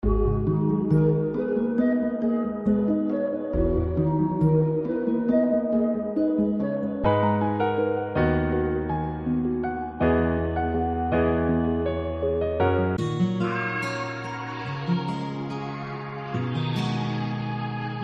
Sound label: Loop Kit; WavSupply; Alan Rodrigo; Starlust Loop Kit